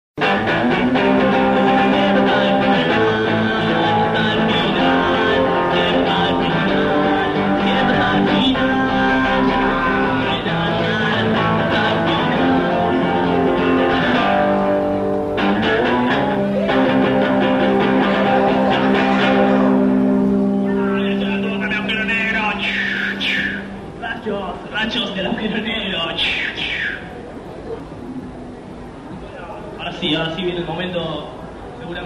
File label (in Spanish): calles punk musico en la peatonal; cordoba 1000